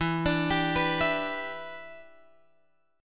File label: E Minor 7th, down, Guitar, Open F; Em7; arpeggiated